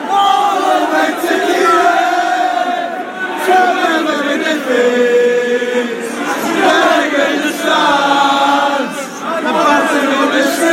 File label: allez allez allez, chants